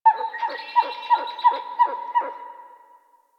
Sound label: Forest Bird Mid; Warframe; Grineer Forest